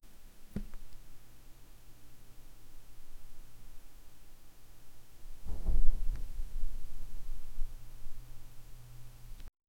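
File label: a flower that opens quietly; flora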